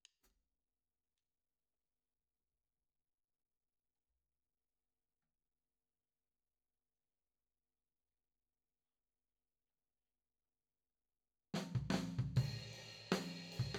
FLOOR

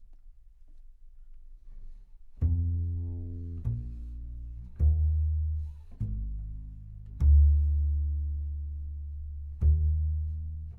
CBass Bot

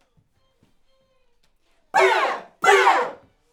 Backs AKG

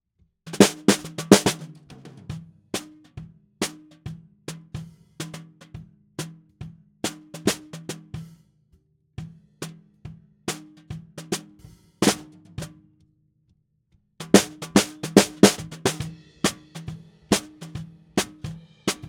SNR BTM

